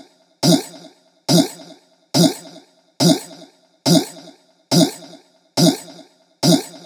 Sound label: Chant Loop 140; Trap and Dubstep